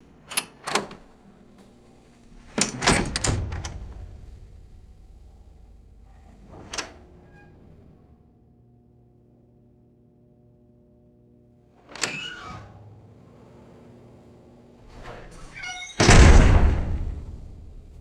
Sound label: heavy metal door opening and closing; doors